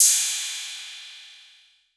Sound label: 808, CYMBAL; Wizoo - Platinum 24 Electronic